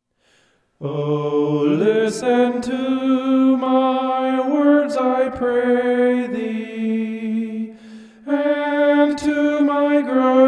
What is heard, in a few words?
vocal homoph; psalm 5